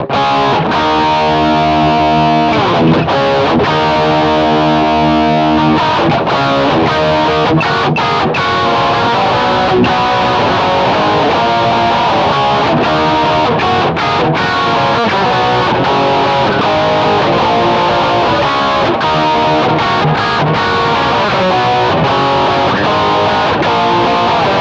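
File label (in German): Rock am Steg, guitar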